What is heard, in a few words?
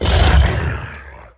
sobekite hit; DAoC; Toa; Gamestar